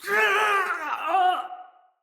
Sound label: Death, Soldier Male; Battle; Barks; Dialog; Dendrit